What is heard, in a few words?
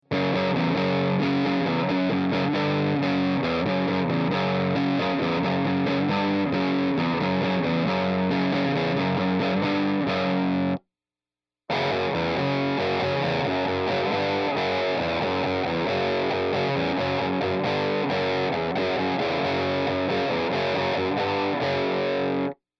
Celestion Greenback